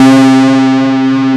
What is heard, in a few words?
RINGMOD, ROLAND MKS-80; Rubber Chicken Software Sample Bank